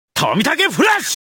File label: Shutter Sound